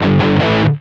Pickup Guitar Riff; SFX Kit; Pickups

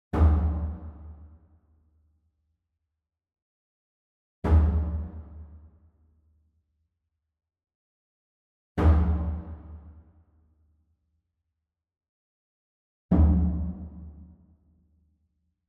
SMALL DRUM Fontonfrom Hit Soft Beater Far; boom library; Cinematic Strikes Construction Kit

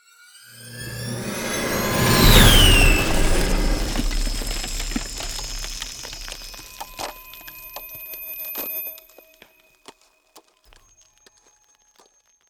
Ice Spell Whoosh, Cold Whooshes; Arctic Aura

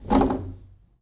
Foosball Center Spin

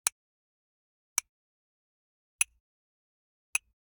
Generic Click Glass Tiny

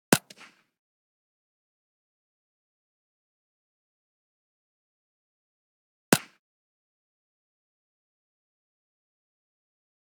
.22 Rifle Blast Close; Hollywood Edge; Heavily Armed

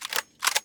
get pistol; firefight

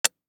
DICE on Glass, Drop, Standard, 1 One Die; Samples; ArticulatedSounds; DICE Sfx Party (Extended)

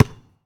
Street Ball Bounce Asphalt; SFX Kit; Street Basketball

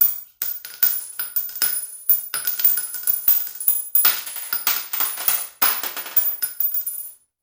Bullet Shell; Sound Ideas; Guns

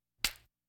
Hospital effects

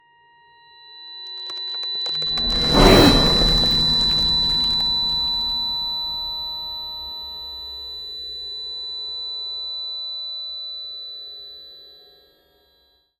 Ice Spell Whoosh, Cold Whooshes; Arctic Aura; EarBreeze

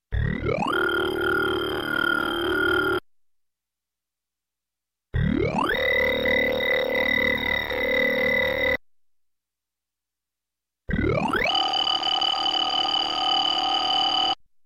Space, Ship Space Ship, Lift Off, Small; Sound Ideas 2000 Series

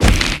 Melee Magical Medium; Kilta

